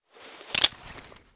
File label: abakan gl activate; STALKER; IWP